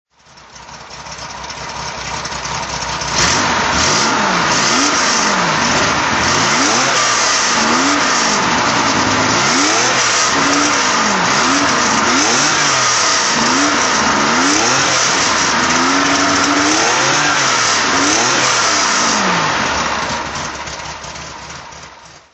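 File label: porsche 911 24s rally throttle, engine sound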